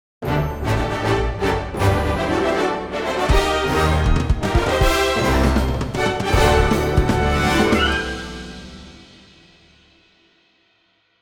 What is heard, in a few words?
Transition